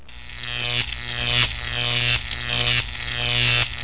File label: Combat Shocker; ChaosHeavy